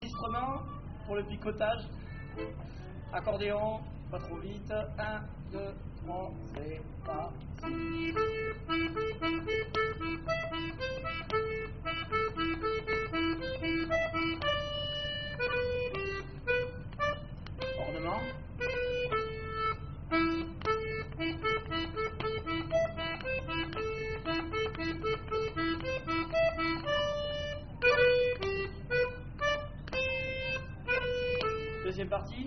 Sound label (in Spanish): Fandango